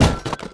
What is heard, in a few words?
Vehicle Quadbike Land; Playable Tutorial Level; TR3